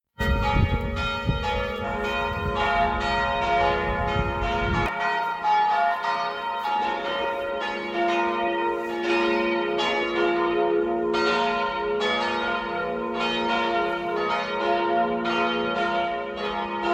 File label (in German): Glocken